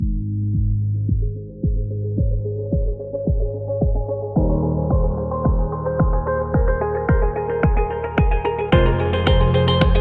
The Corporate; corporate interview

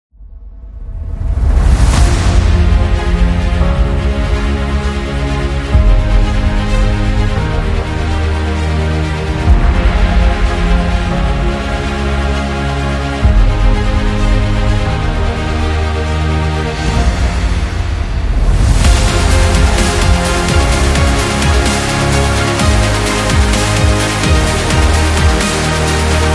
Trailer Dubstep; epic sport metal

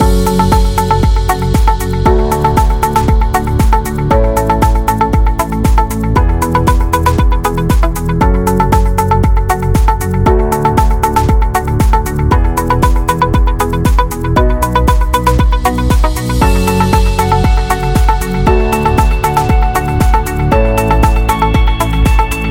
Fashion Loop

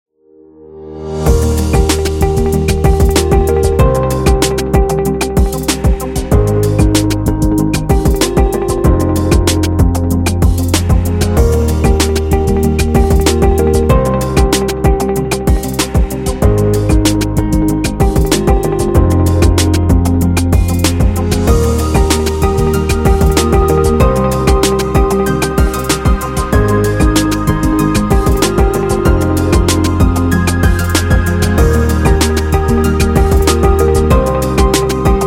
Light Corporate; waiting music

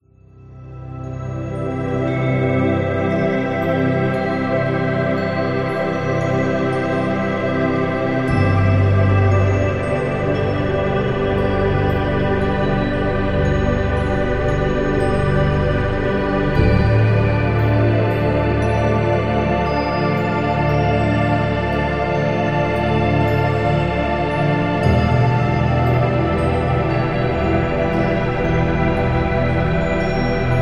Meditative

